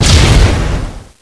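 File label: grenade explosion; cstrike; codmw2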